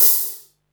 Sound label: HAT, Techno 138 BPM